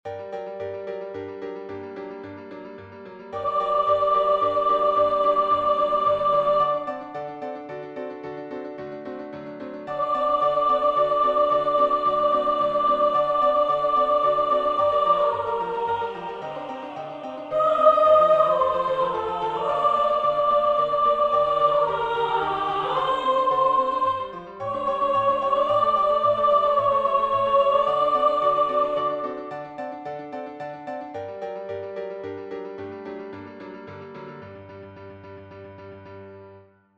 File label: Fecit Potentiam sop